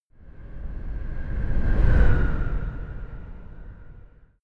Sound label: sci-fi vehicle train passing fast long; raumschiff